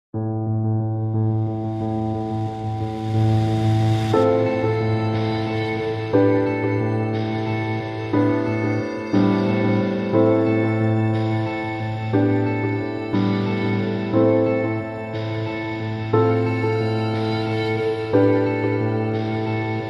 Música De Fondo Para Documentales De Intriga y Suspenso

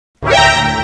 Efecto de sonido Sorpresa

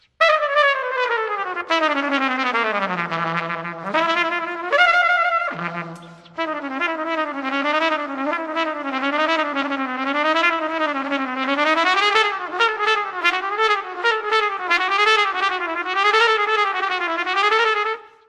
trompeta

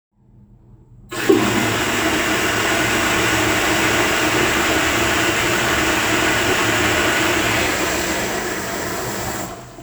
Cadena vater

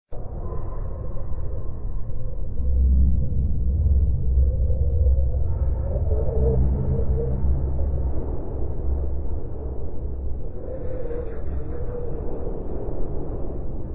Sonido del planeta Marte

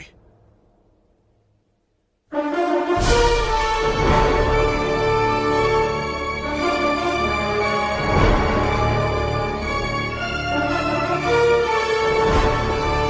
Música épica y de suspenso; Spiderman

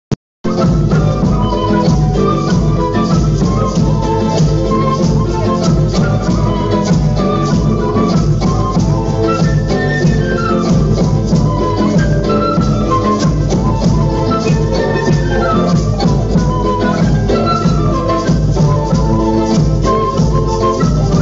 Músicos

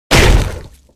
Sonido golpe

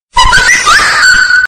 whatsapp ruido distorsionado

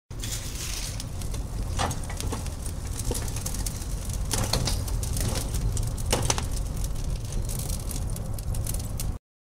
Incendio perspectiva cercana

